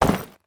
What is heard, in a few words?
duffel bag drop